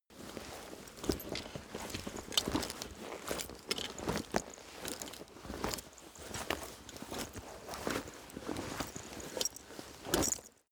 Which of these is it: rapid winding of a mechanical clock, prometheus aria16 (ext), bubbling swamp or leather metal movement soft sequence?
leather metal movement soft sequence